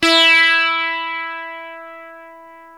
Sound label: Sitar; Club-50 - Foundations Roland; Dano Sitar; Dano Sitar1